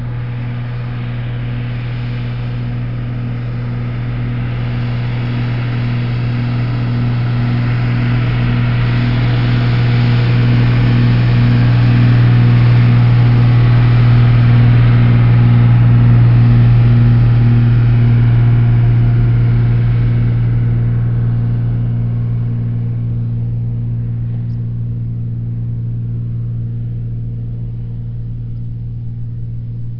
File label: truck